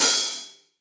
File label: Hihat Open Reso Classic; Drum Hits; Ableton; Factory Packs; Session Drums Club; MPE Hits